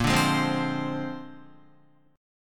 Bb 9th Bb down Guitar 4F,4A,5C,5G,5C,6E; strummed; JGuitar

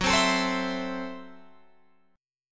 Gb Minor 7th Flat 5th, Guitar Open F; strummed

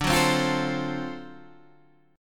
D 7th Sharp 9th, Guitar Open G

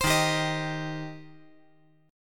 Eb 6th Eb down

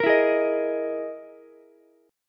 E Major 7th Flat 5th, Ukulele; EM7b5 strummed; JGuitar

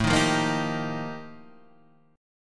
F 11th A, chord

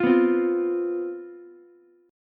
C Major 7th Suspended 4th, down, Ukulele; strummed

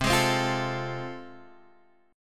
A Minor Major 7th Sharp 5th, C

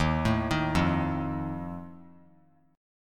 D sharp Suspended 2nd Flat 5th, chord

